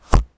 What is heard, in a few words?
Popsicle Stick Pluck